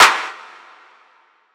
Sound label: clap saucey; Drum One Shots; SD MIDNIGHT Sample Pack; Slate